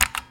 Vintage Keyboard

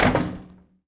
Metal Footstep

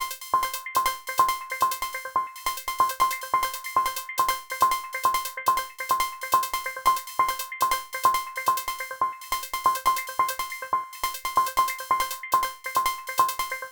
140 Bpm Attack Loop

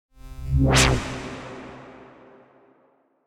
Wet Transition

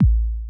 Bump

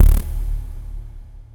Beast Stab D

